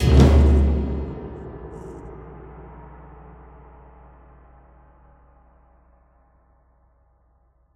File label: combat start